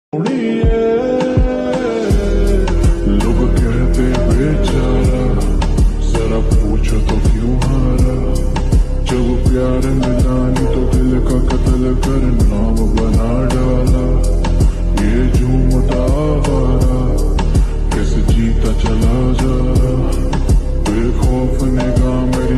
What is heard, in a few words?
lofi music